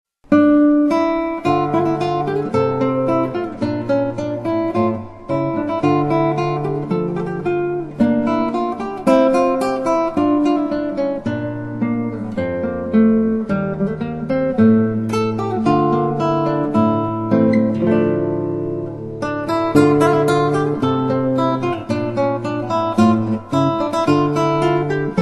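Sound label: baroque progressive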